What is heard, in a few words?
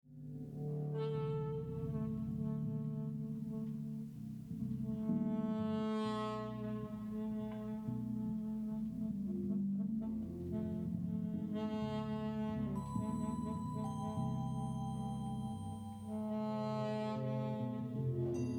Chimes; Cube Orchestra